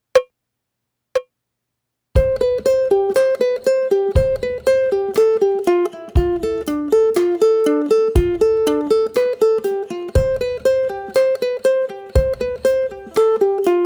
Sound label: fiddle tune